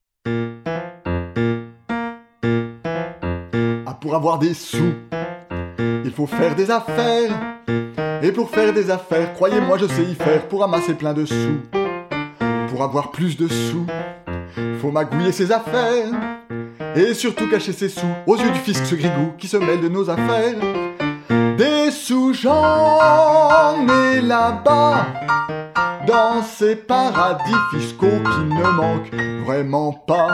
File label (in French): 1 voix, chorale; Ah pour avoir des sous